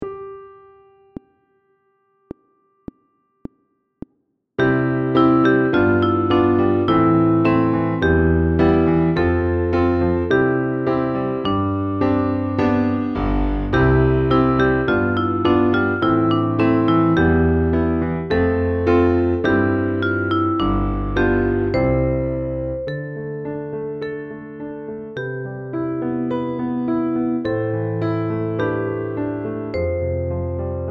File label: Piano Vocaline